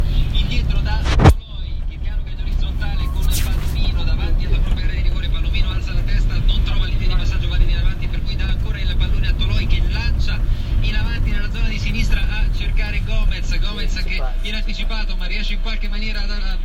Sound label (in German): busfahrt; salina; 3 monate segeln in italien